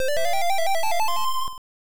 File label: Rescue Jingle; Konami Wai Wai World; Family Computer